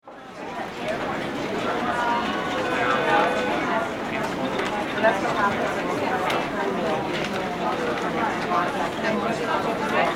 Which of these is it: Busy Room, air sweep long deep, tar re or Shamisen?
Busy Room